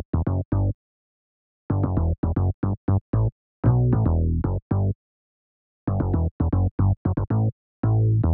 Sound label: Bass, Am 115bpm; Zero-G; Nu Disco; Construction Kits